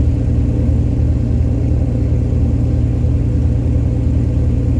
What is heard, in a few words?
stuka cockpit, engines; War Thunder